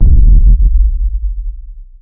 explosion rumble; explosions; War Thunder; tanks

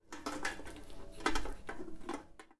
lantern deploy; Rust

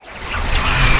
gun reload; World of Tanks

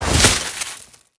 snowmobile suspension splash; veh snowmobile suspension splash

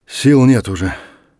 tired, voice; Escape from Tarkov

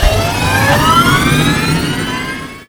ui arcade streak won; user interface

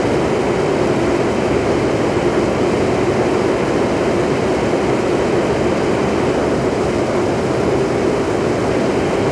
Aircrafts Plane Fur Wind In; DCS

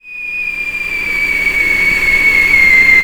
incoming; Explosions

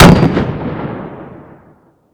Vehicle Weapons; Red Orchestra Ostfront 41-45

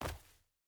footstep burlap concrete jump land; Rust

